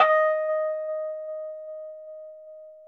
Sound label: Rhodes 4D#; 3D Sound; 3D Keys VOL-1